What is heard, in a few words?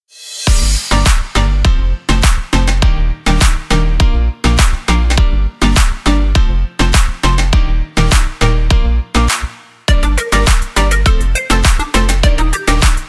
Tropical House